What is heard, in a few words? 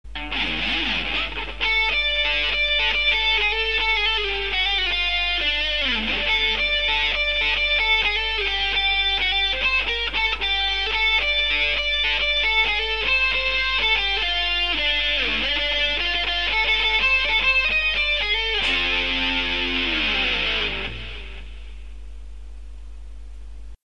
guitar cantina; musique